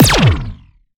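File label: Sci weapon Shot Small Laser Zapping Pulse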